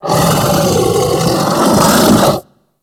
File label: Kubrow Tame Sleep Idle Yawn C; Warframe